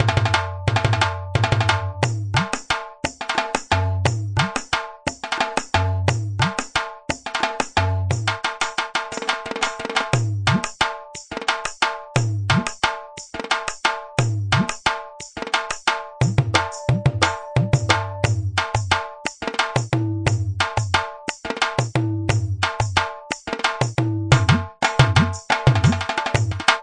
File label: GARBA, grooves